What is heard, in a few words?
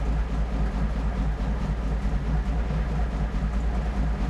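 cromwell engine interior rpm; cannons; War Thunder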